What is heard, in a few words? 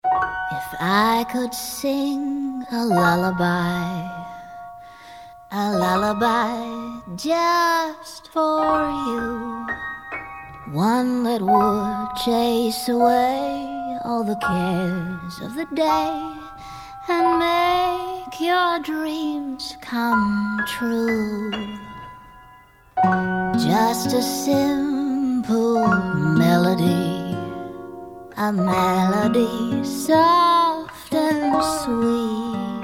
Lullaby